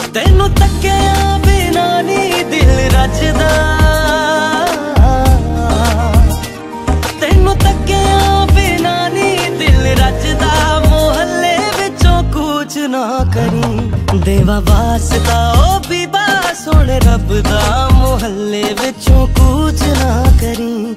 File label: Bollywood Movie Song Ringtone; Tiger Zindaa Hai